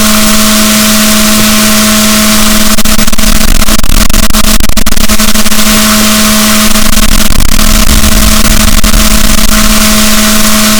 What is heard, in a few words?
rorquals negatif, boat